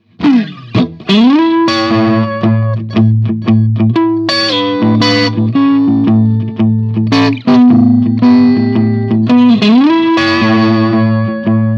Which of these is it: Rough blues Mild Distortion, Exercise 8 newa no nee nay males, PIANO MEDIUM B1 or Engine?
Rough blues Mild Distortion